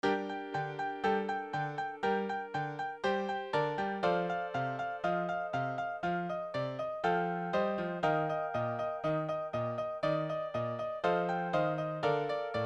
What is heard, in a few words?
Fun With Minor Scales G min